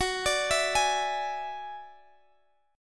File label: F sharp 7th Suspended 2nd Sharp 5th F sharp